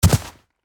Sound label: Footsteps on snow boots single step